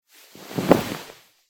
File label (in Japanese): 布団に押し倒される